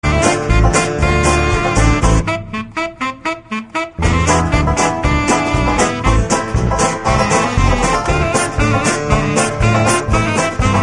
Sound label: triangle square ringtone